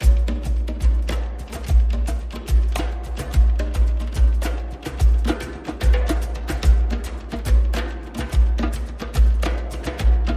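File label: Percussion and Pulses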